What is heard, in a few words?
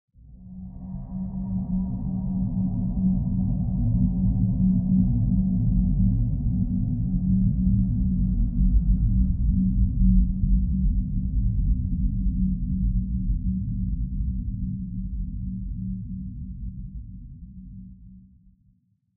Ambiance Dark Short